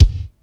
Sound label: thumpy kick drum F sharp key, kick sample tuned to the F# note. Loudest frequency: 380Hz